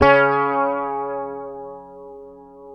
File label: Fingered bass C#3 R; Sampleheads; Will Lee Bass Library